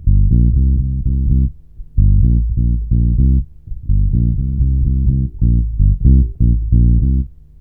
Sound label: REGGAE C, Bass Grooves; USB Soundscan vol.30; AKAI